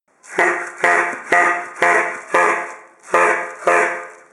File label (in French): son klaxon poire